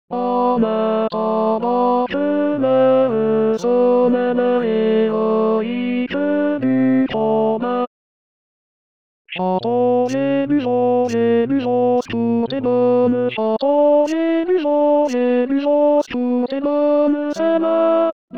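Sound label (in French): ténor voix accompagnement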